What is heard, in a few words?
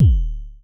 FM Kicks D1, Vermona Kick Lancet; LookIMadeAThing Kicks